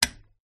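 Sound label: Lamp Twist On Off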